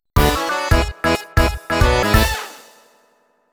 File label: Mini-Game Victory; Mario Party